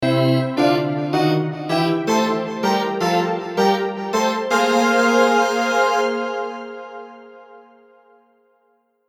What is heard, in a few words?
train melody